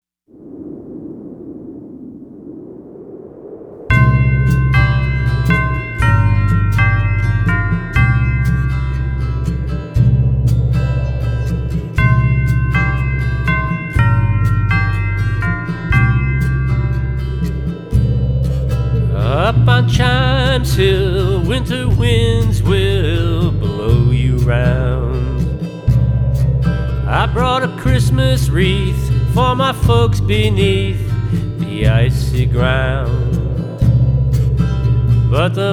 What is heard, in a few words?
chimes hill